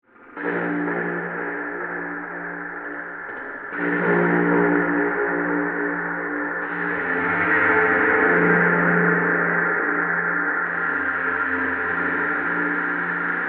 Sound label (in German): Bassklarinette Delay Filter Reverb